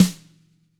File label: TAMA SNRD HIT; ILIO; Double Platinum Drums 2